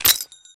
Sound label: pin pull; svencoop; weapons